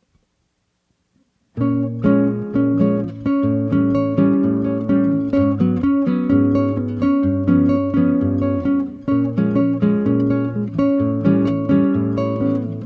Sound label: samba